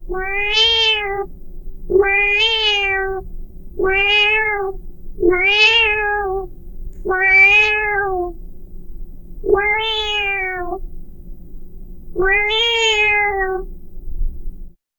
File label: the cat looks at the; animal behavior